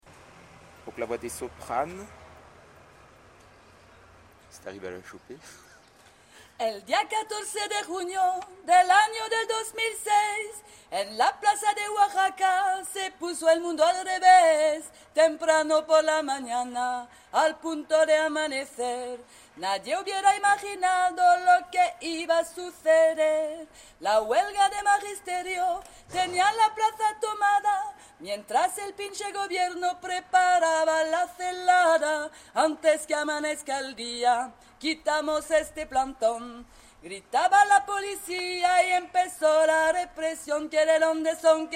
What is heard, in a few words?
soprano